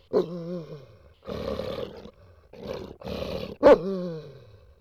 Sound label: dog bark snarl